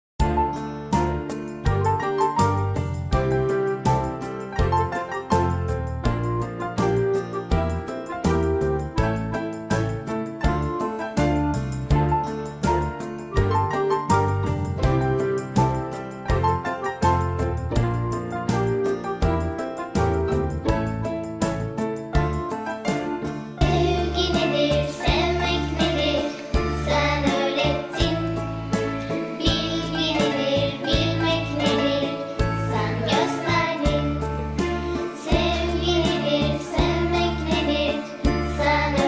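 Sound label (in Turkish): öğretmen şarkısı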